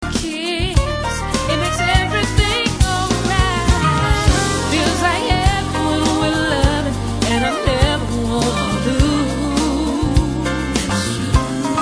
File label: Pop